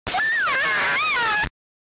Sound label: Pikachu SFX; RBY